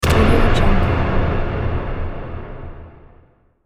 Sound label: atmospheric exploding smash; sonic-boom